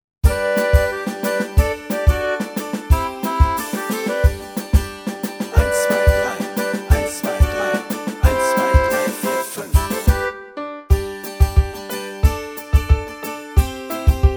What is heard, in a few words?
Karaoke